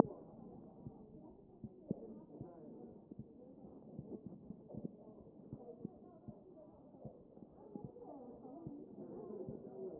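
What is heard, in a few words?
anterior chest, position 4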